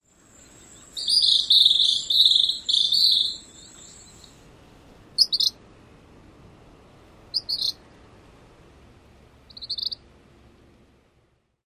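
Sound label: calls, bird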